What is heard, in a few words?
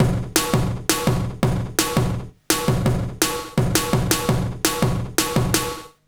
166 BREAK, Jungle; USB Soundscan vol.05; AKAI; 90s sample CD